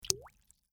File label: water drop